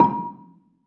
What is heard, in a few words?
BELA A#4, BELAFON, Asian Traditions; USB Soundscan vol.60; AKAI